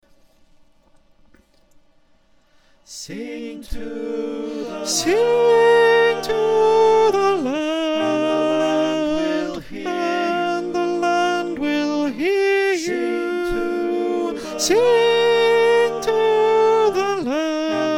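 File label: soprano, choir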